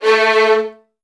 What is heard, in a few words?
A1, VN 16TH, Virtuoso Strings; ILIO; Kirk Hunter